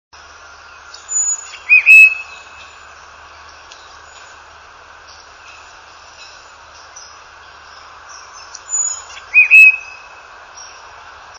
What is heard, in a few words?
titmouse courtship calls, tufted titmouse; birdsongs